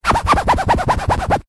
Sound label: household canvas frame picture large fingernails rub scrape scratch fast; zapsplat